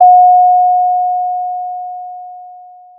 Sound of a doorbell

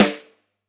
close Rim, drum

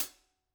room Cl Hat, drum

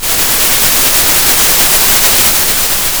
pop, Sound of a thunderstorm

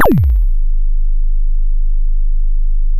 pop, sound of a bike bell

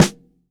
Snr, drum

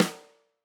Tam Snr, drum

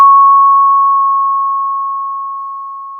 pop, Sound of a school bell

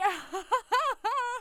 surprise strong; VIVAE

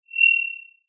Sound of an owl

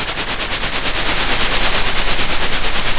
pop, Sound of a train

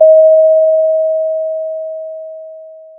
pop, Sound of a doorbell

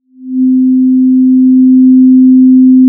Sound of a cruise ship horn

